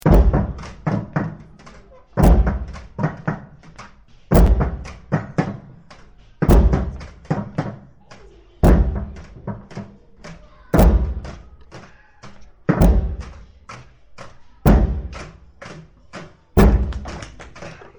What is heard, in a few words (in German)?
rhythmus